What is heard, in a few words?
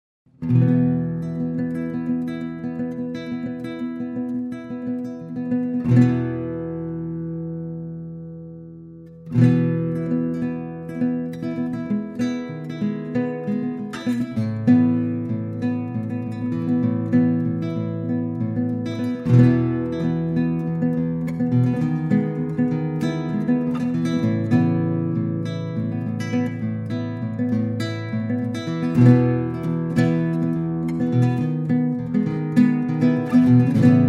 Custom Dream Guitar